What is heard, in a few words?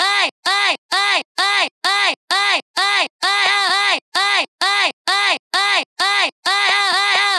vocal loop 130 Gmin; TROPKILLAZ; bailevox